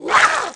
Mutant Swipe Attack; Valleakan Mutant 1; Aliens

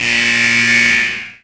Subway Passing Lights

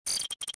fuelpump; ambience; Star Wars Jedi Knight II Jedi Outcast; XBOX

